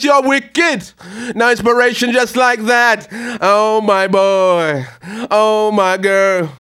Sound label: HAL RAGGAM, RAGGA-MUFF A maj